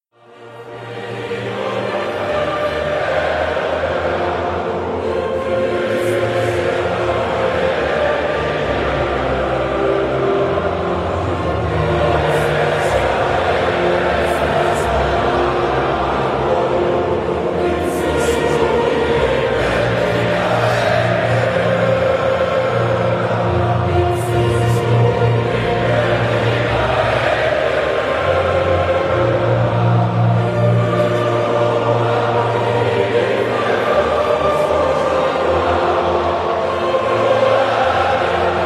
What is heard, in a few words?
Sweden National Anthem; EURO 2016